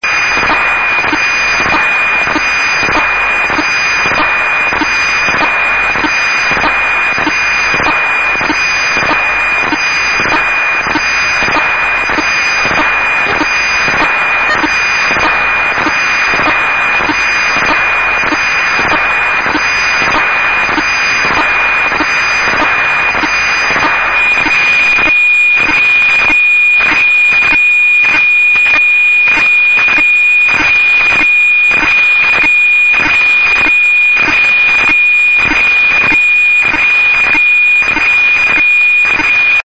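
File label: NOAA 3, satellite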